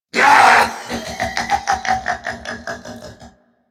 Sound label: laugh; Grineer; Warframe; Grineer Chem Nox